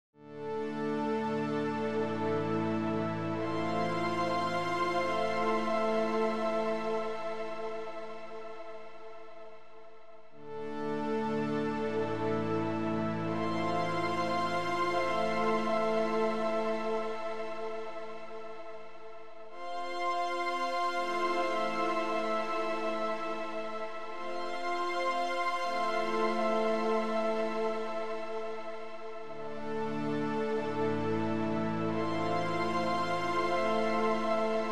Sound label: Strings